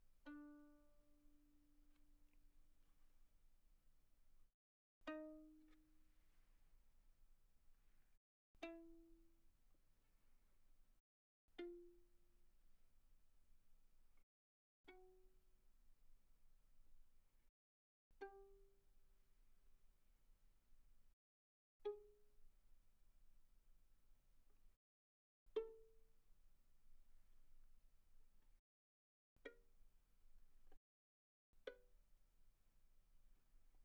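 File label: Violin pizzicato pianissimo sul D D4 B4; Strings; violin2012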